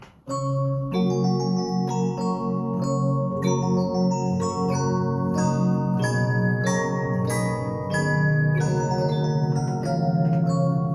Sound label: Reginaphone Internal Horn